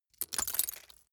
glass debris fall single short; objekte

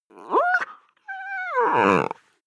chihuahua growl whimper; natur-tiere; hunde

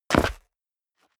step leather shoes concrete grit jump; schritte

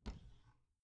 bathroom cabinet door creak open regular

